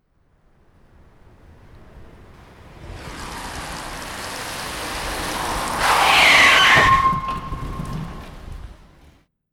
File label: Car Hits A Person Imitation